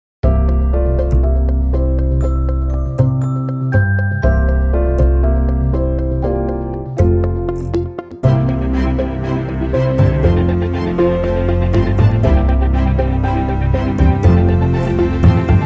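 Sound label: Moving Up, No Percussion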